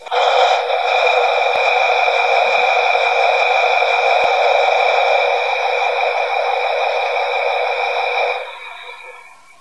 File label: Diesel